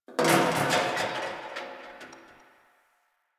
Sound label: Garbage Can Rattle; Ambience; Warframe; NineteenNinetyNine